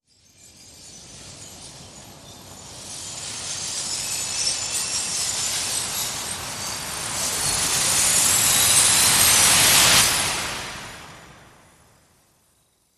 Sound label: Whimsical Glass Storm Rise